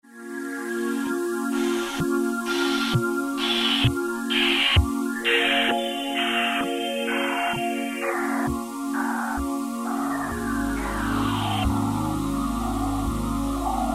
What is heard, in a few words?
pad; pad-Glimmers; E-mu Orbit V2